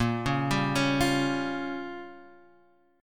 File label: A sharp Minor 6th A sharp down Guitar Standard, chord; arpeggiated